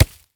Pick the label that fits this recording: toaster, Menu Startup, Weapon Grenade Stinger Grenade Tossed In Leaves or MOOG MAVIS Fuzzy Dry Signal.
Weapon Grenade Stinger Grenade Tossed In Leaves